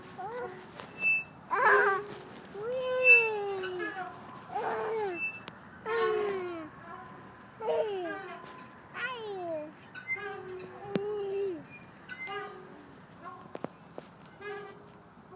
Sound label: swings a childs laughter; city sounds